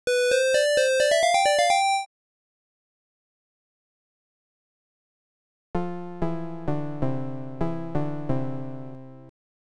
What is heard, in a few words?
Game Over Sound; Guardian of Silverkeep